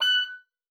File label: OBOE2, F#6; Xsample VOL-6